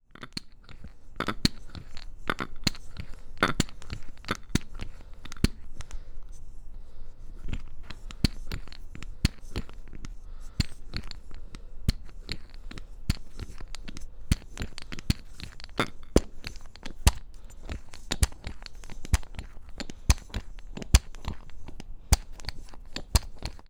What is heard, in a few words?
Vacuum Clamp, Workshops